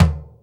Toms, Real

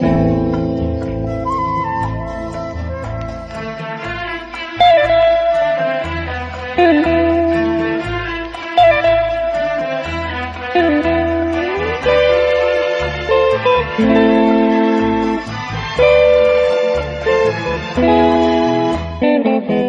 guitar spanish, Loops

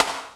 knar, snares real

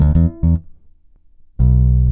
bass

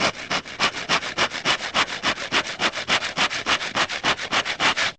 sawing

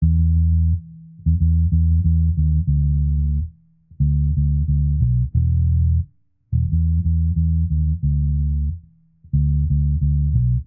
bass dark sun riders, Loops, Phonky

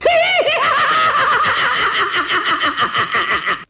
laugh clown

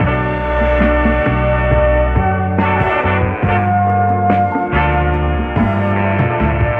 horns, phonky loops

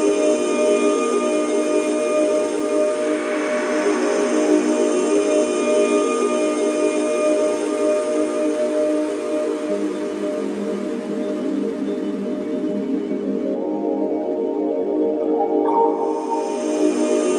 vocals of change, Loops Electronic

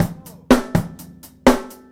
Boombap drum loop

